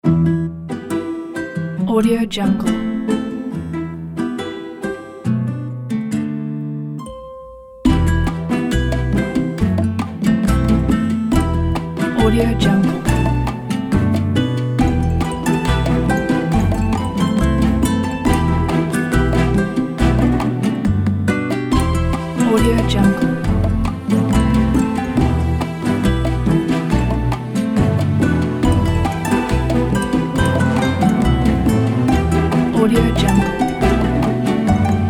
a holiday romance; vervesound

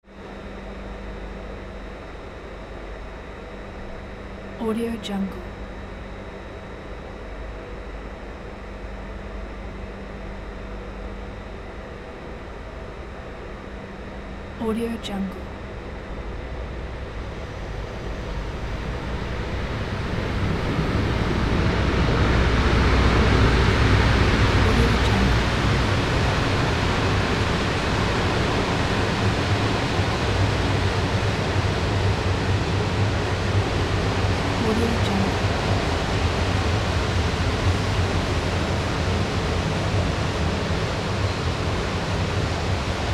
train arriving and stopping; mountainaudio

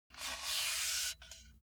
foley grab metal pole slide; smartsoundfx

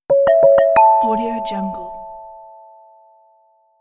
notification

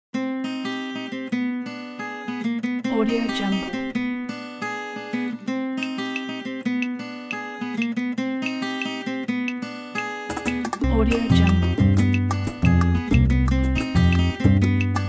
latin mind

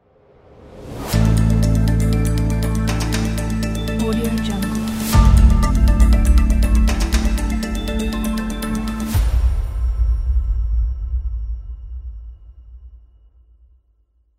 a tv logo; diamondc

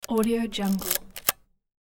camera antique manual advance; hollywoodedge

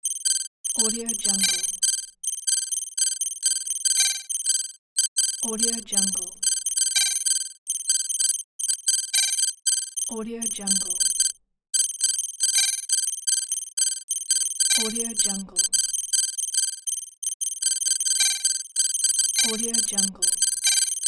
digital data loop

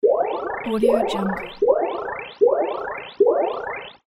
cute magic charging warp

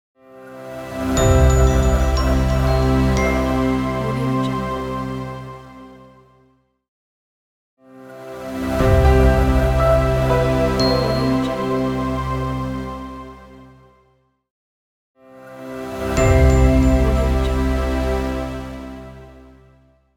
short logo reveal soft and warm

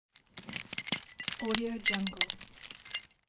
chain clang

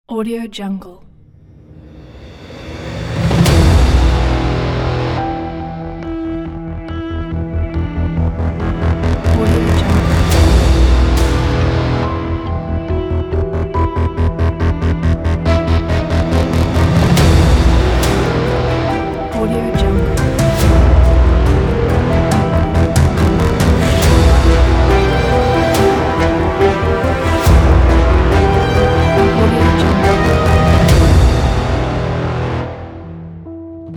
dark suspense teaser

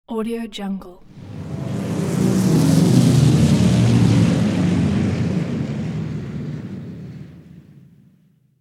big propeller airplane passing; sound-ideas

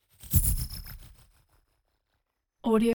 sci-fi flyby insect drone flutter; smartsoundfx

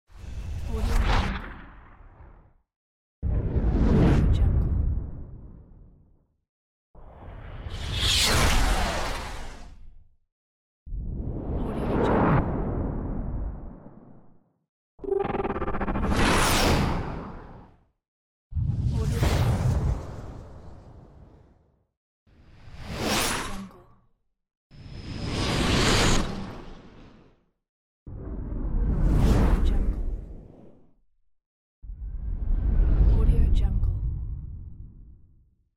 speed whoosh

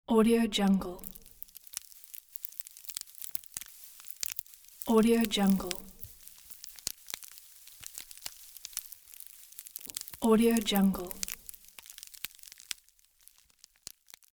fire noise snaps crackles background

